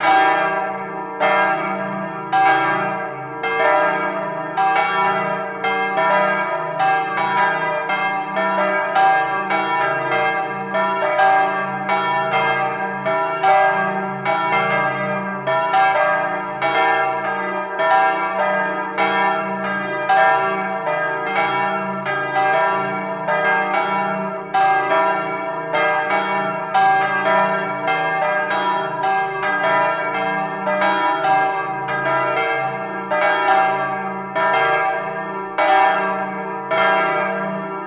bells church; best service Blue Box

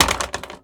Toilet Paper Dispenser, Bathroom; 1001 Sound Effects

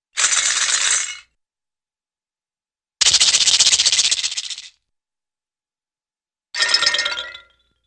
Gourd Rattle, cartoon; Hollywood Edge; Cartoon Trax

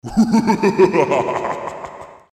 bad roll; Dice World

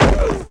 Football Hit Tackle

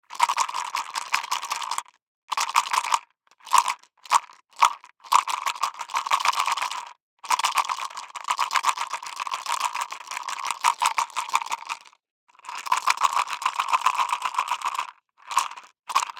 DICE in Plastic Cup, Shake, 6 Six Dice; Samples; ArticulatedSounds; DICE Sfx Party (Extended)

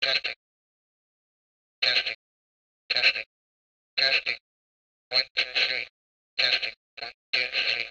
walkie-talkie transmition; devices accessories utilities; NS Studios - ultra sound collection

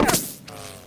winchester wall; firefight

